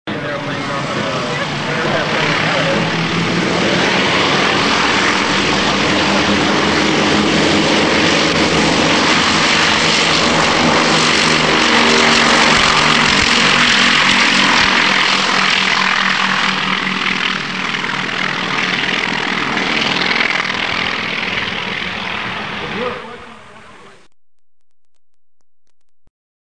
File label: S-2 Takeoff